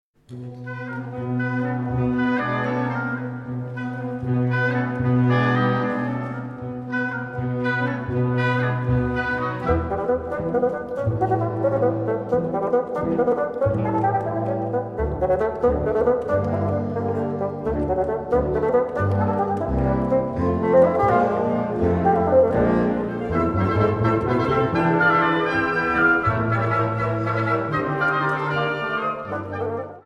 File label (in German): Concerto fuer Fagott